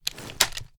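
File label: shotgun reload end fast twist; reloads; iw8; romeo870; gmod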